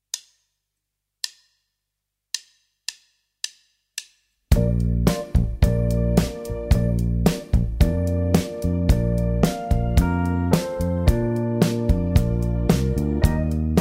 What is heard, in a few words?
jingle bells